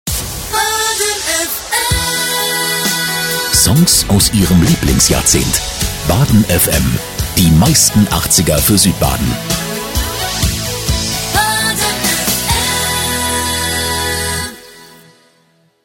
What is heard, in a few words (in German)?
JINGLE Songs aus ihrem Lieblingsjahrzehnt